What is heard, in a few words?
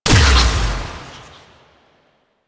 Fire Medium; Weapons; Warframe; Duviri; Incarnon Adapters